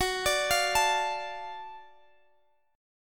Gb Minor Major 7th Sharp 5th, Guitar, Half Step Down